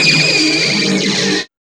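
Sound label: SYNTH WHISTLE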